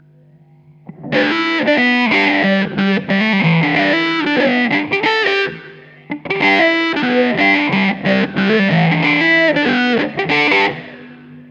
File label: fuzz autowah